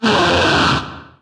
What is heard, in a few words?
chase growl; fallen